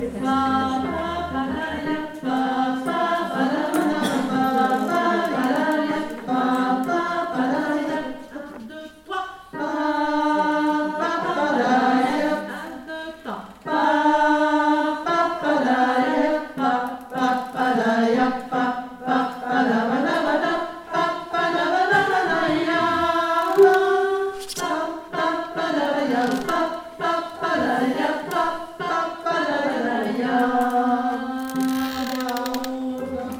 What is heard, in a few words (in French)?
pa pa pa da iap altos, chorale